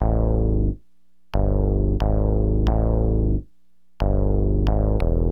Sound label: BASS, HipHop Bass; LiveAct Pool 1